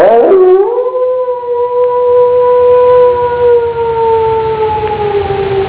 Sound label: howl, wolf; wataha